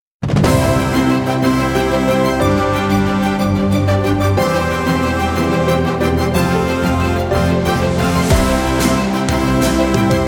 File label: victory nomination winner champion music